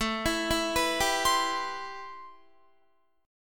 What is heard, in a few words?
A-Minor 9th A Guitar; Am9; arpeggiated